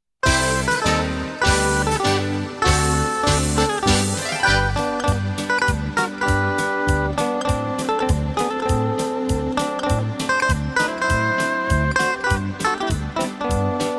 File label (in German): orchester; wiener melodien